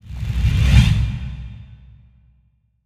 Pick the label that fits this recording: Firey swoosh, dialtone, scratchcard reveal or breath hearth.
Firey swoosh